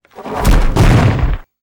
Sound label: TWEEZER WAR ATTACK